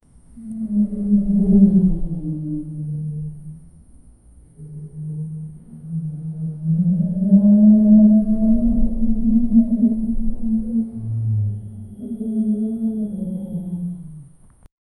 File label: intense deep horror thril